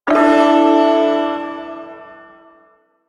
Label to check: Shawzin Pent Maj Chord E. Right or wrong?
Right